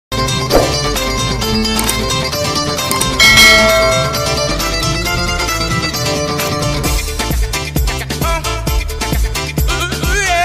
music ringtone; no entry

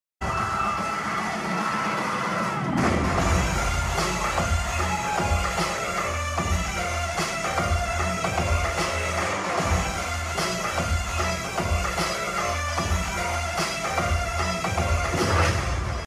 movie villian entry bgm ringtone; Double Ismart

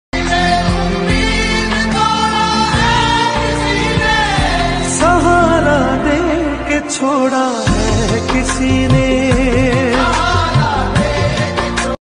sad song

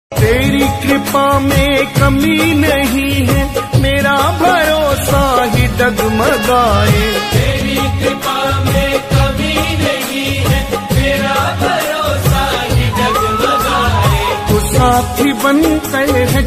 song ringtone, bhakti ringtone; khatu shyam; shyam baba

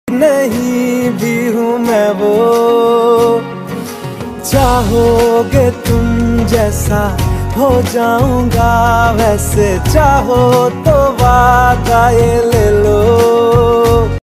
love ringtone bgm ringtone; bad boy ringtone; love call ringtone

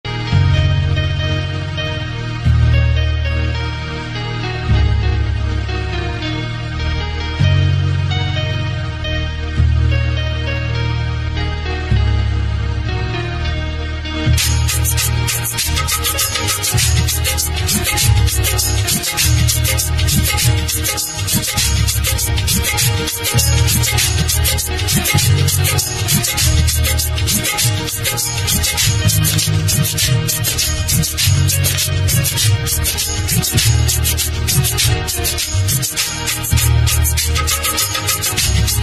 ramayana ringtone instrumental music ringtone; ramayana siyaram

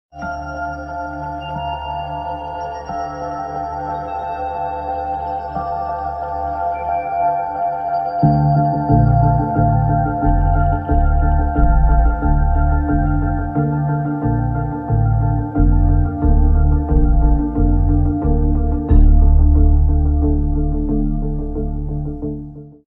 instrumental ringtones; the knick

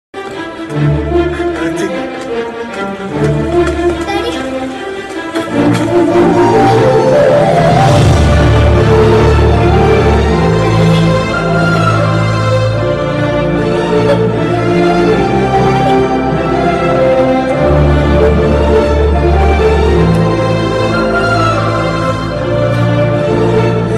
climax bgm ringtone; Viswam movie; Gopichand

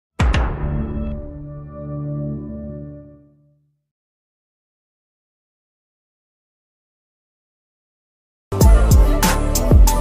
instrumental ringtone

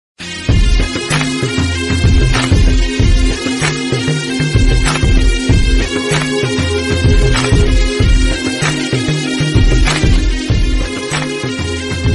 love bgm; vallavan; viral bgm famous rington

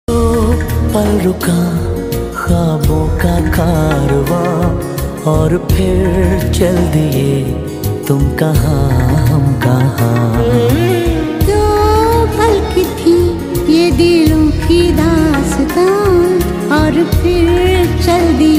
90s hindi songs music ringtone

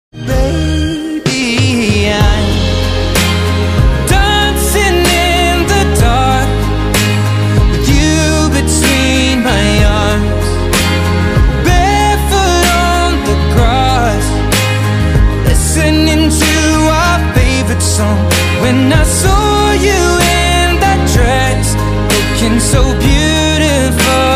perfect ringtone

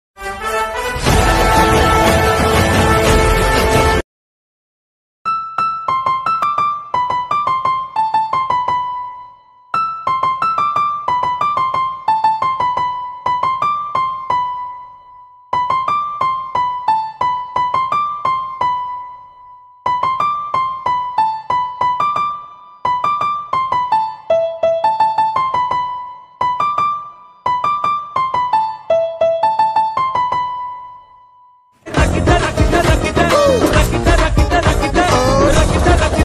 bgm easy piano

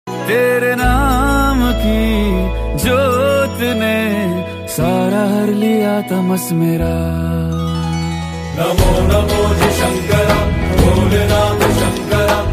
bhakti ringtone; lord shiva

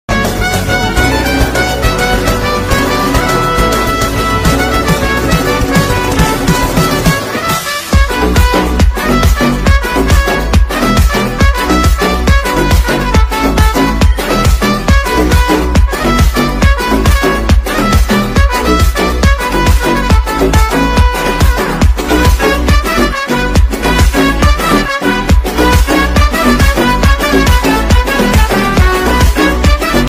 call ringtone; aavesham

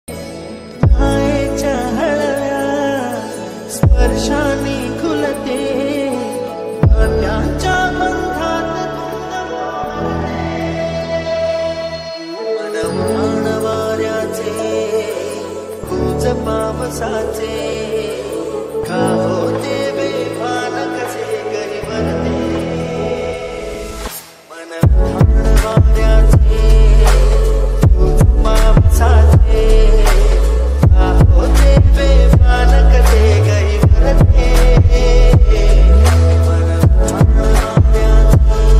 ringtone bgm

